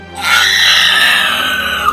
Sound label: screech